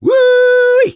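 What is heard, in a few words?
Engineer taunt exert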